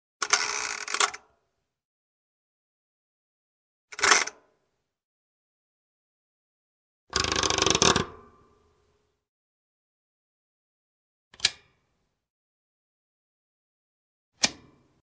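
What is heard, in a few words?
sound of turning an alarm clock switch.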